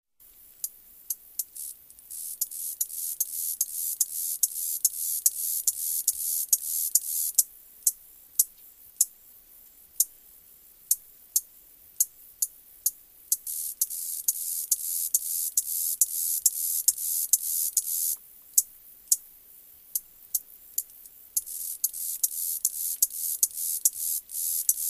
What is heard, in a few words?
Clicks And Ratchet Sound Of Grasshopper; Close Up; Very Light Crickets In Background